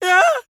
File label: male scream